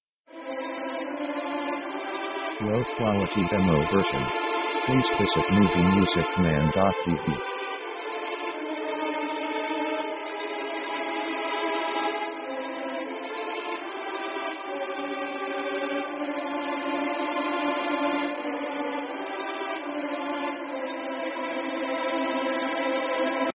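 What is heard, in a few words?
orchestral russian theme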